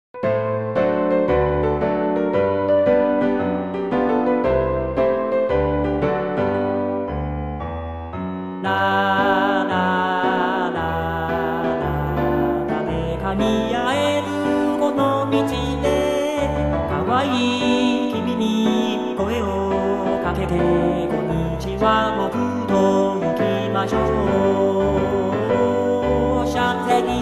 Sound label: chor-t